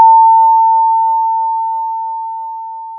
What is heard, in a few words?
Sound of a school bell